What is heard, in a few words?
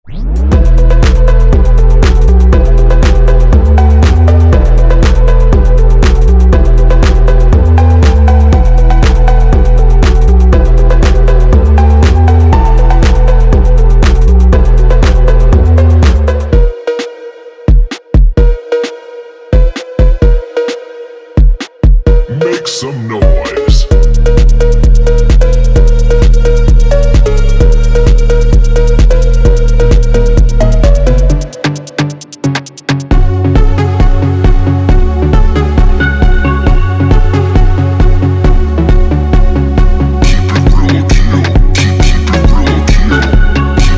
Drift Phonk